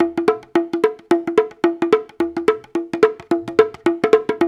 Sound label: UDU, Udu Bata, African; African Contemporary; Spectrasonics; Supreme Beats